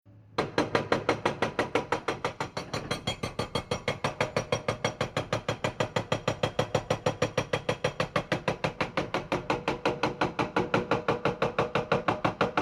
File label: Industrial Mechanical Quarry Pecker Stone Breaker Digger Breaking Stone Long Changing Pitch; Construction Vehicle Sound Effects